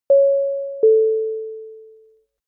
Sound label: announce tone